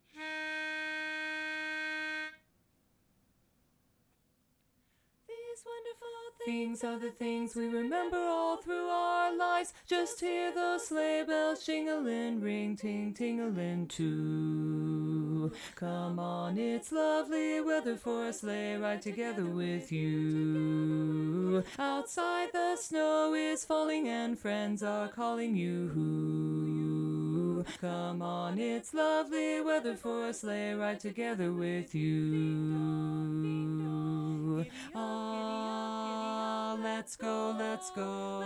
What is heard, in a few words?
Bass; holiday music